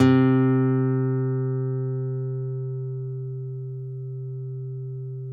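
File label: nylon, FLAMENCO, Guitars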